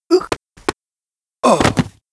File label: Mob die; Monster; 욱일승천류; 부풍단주